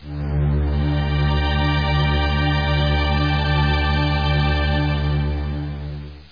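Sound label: tense music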